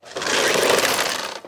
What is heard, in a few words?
sign spin; irish pot luck